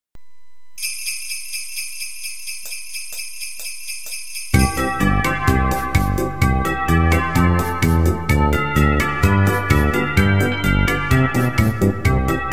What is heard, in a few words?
Jingle Bells; Christmas